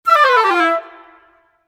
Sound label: Woodwind run E7 (for A min); PowerFX; Downtown Orchestra; Runs